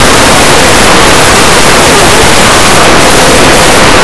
squeek

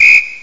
WHISTLE; eXtended Instrument

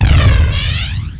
fly ball; lamsword

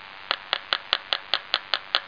TAP; Greatest Stories; fairy

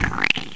slime landing; tritus

